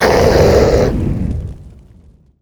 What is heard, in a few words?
The Beast Death